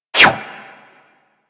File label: Bullet Parry Metal; Warframe; Bullet Parries